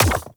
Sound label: Hand Bomber Proj By Bounce; Warframe; Man In The Wall